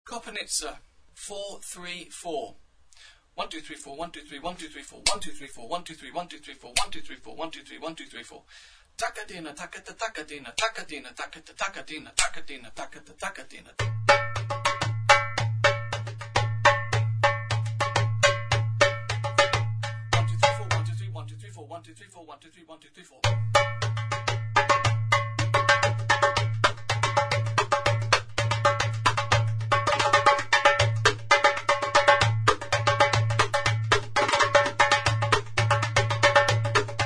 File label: kopanitser